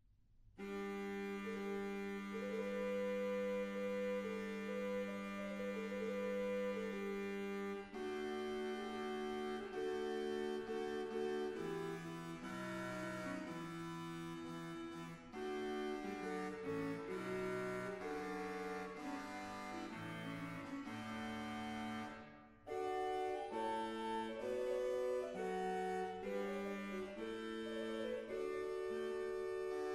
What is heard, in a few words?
Krumphorn 8, organ; stade